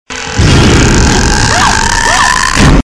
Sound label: Scream; The Grudge